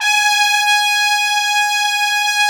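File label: G#5 TRUMPET; Northstar - New Gold VOL-1; ST.TRMPTS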